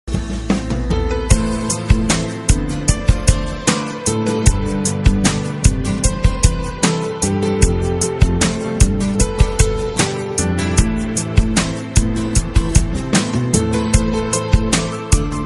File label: Instrumental ringtone